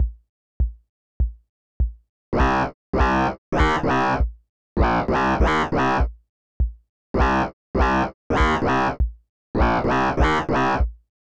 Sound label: Synth